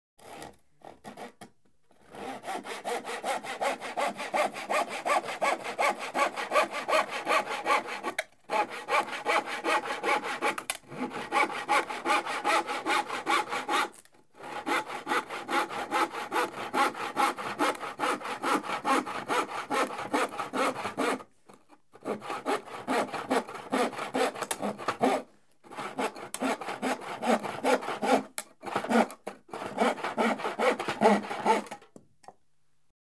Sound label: Hand Saw, Sawing A Piece Of Plywo; Household; BBC Sound Effects Library